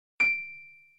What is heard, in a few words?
Piano